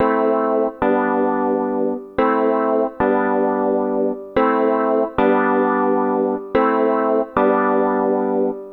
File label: Synt; SYNTH; 110 BPM